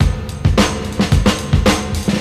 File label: 108 bpm drum beat d sharp key, kick tuned to the D# note. Loudest frequency: 1110Hz